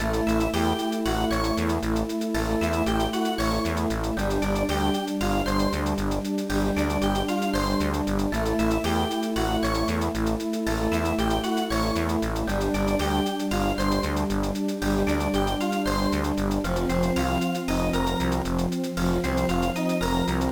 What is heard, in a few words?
BGM; GBA